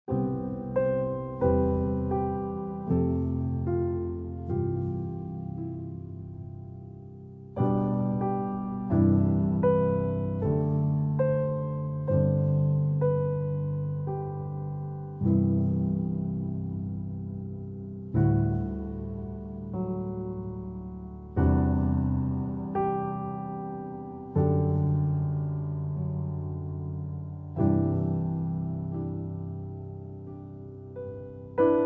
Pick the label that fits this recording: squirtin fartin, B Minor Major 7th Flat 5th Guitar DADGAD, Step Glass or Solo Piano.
Solo Piano